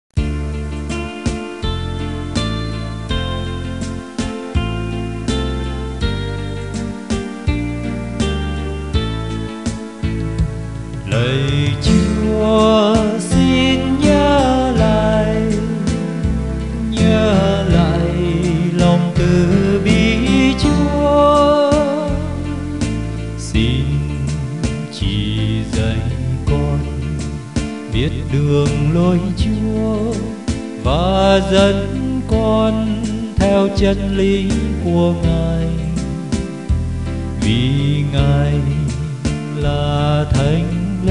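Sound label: soprano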